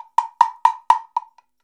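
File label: ambient cowbell, percussion; Univers Sons; Basicussions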